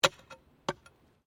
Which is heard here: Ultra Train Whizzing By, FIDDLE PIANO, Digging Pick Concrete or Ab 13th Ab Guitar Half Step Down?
Digging Pick Concrete